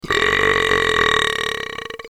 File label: brap